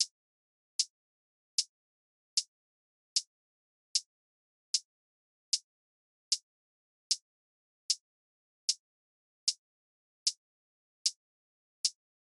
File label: Drum Loop, 152 BPM Hihat; Cymatics; Vibes II Drum Loop Collection; For You Drum Loop